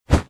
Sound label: Club Miss; The Battle for Wesnoth